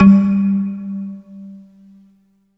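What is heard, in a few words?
MARIMBA G2; East West - Phat & Phunky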